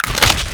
larva attack; starship trooper; bugs; Nejlepší České Hry

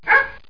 DOG; Tempra Access; vision

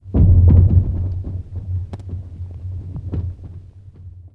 Cracking; ImpGal2; colony

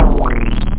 quirk, synth bass; da capo vol1

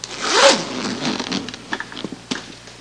LONG ZIP; Easy Personal Web Page

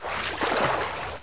swim; Hexen 2; player

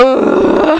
Moan; MacFoxes Adult Game; Girl2Dungeon

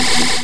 Door Laser; Operation Diva

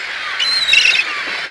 SEAGULL; animal fun

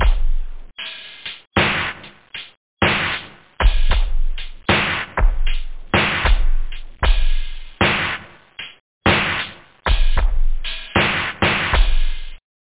swing, loop; da capo vol1; instruments

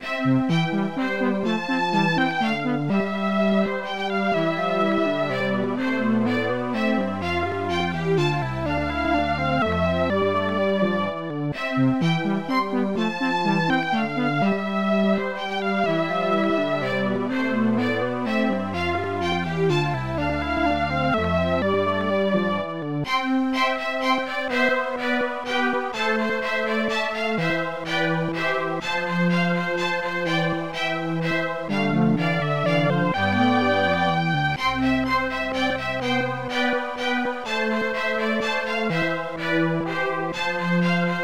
Synth-Quartet

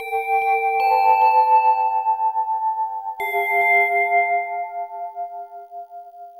synth; Evolution

